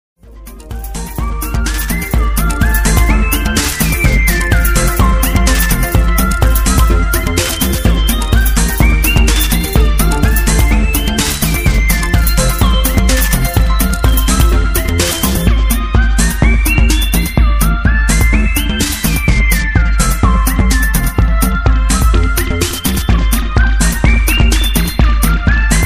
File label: Glitched mix